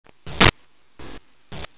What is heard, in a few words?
blast; Scratch; Duck Hunt